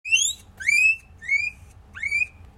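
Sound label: alert, レムは急に触られる、特にお尻を触られると、2 kHz以上の高い声で鳴きます。; 話しかけてからそっと撫でると鳴かないことが多いので驚いているのかなと思います。; 0歳11ヶ月20日; guinea pig; 他にも野菜が欲しい時（冷蔵庫を開ける音や流水音を聞いた時）にも同様に鳴くことがあります。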